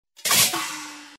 crash; disney; mashup

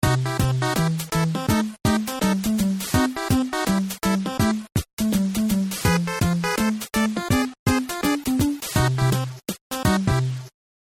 song; Wreck-It Ralph

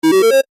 finish Game; toystory; buzz

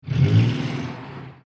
Rev; Rusty Rust-eze; cars

